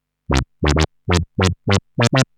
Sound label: Arp D Line, ARP Odyssey; musicradar-synth-samples